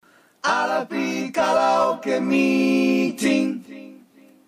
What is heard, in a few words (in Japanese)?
ジングルショート セリフなし